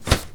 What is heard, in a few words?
shield hit; Combat